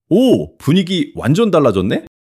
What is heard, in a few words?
surprise